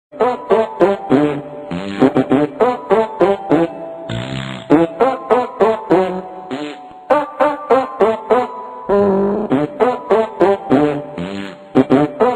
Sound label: jingle farts; ringtones